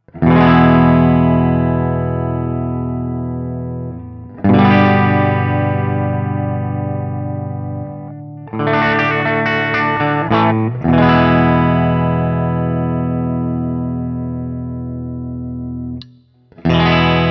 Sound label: Guild S65D ODS100 Open